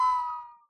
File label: short fall; Minecraft